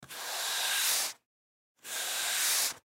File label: Pool Brush Off Felt, Pool Brush Off Felt Table Swipes; SPORTS; Blastwave